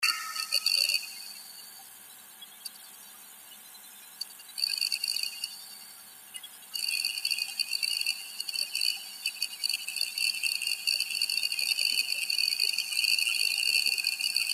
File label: activity, seagate barracuda noise; seagate barracuda 400GB